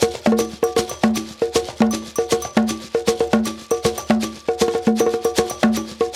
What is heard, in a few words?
African Tap; African Contemporary; Spectrasonics; Supreme Beats